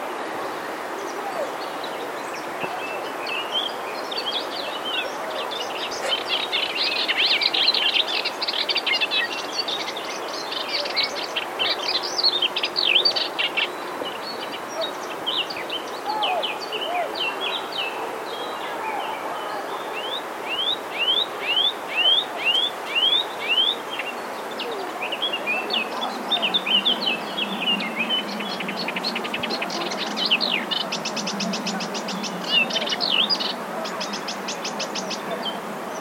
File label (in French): hypolais polyglotte